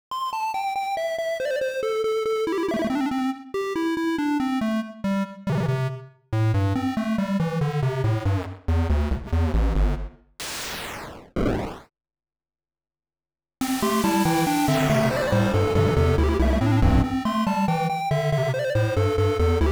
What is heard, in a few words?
beepbox song